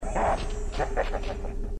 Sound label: laugh; hunter